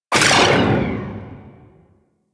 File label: Railgun Charged Mech; Weapons; Warframe; Archwing